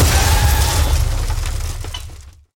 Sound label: big Win Text Change; netent; reelsteal